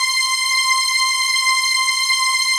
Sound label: PAD, Dance Mega Synth